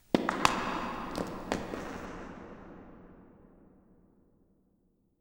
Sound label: Rocks; Inquisitor 3